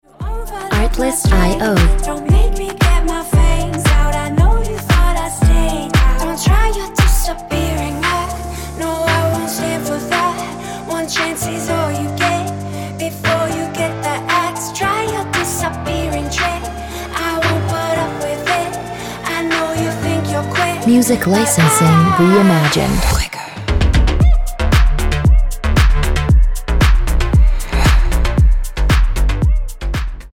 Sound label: Ringtone